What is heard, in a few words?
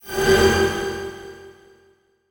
Transition Whoosh Drone; Production Element